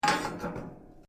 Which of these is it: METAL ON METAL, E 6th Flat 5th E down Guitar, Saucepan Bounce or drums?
METAL ON METAL